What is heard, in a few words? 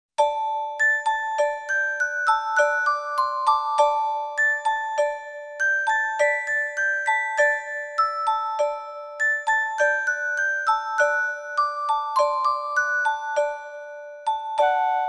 music box fantasy